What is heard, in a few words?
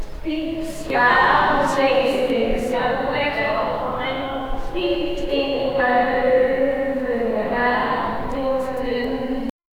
the echoing noise inside, prison